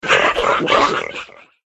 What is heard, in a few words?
iguaron attack voice up